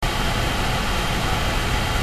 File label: fan